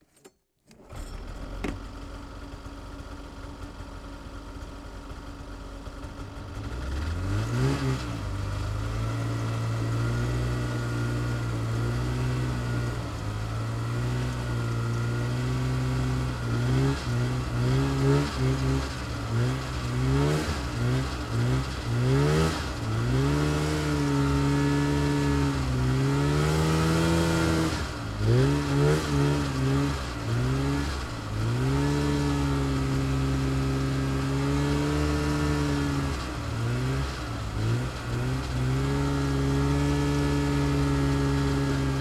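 polaris indy trail deluxe onboard start drive circles stop off engine hood; DPA4061; 1991; Pole Position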